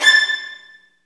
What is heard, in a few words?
STR VNB2, Vlns Marc; Roland L-CD702; Vlns Marc1-3